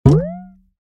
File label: boing light bounce; smartsound fx; punt